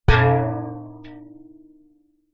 industrial metal canister large containing water hit bang against object